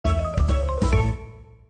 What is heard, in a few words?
fishing try again